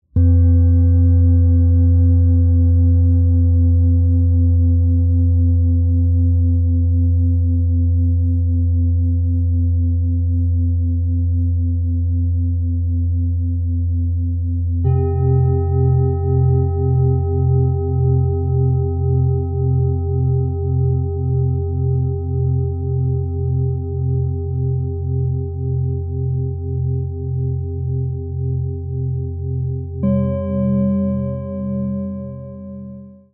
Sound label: Antique Cycle of Fifths Set Strike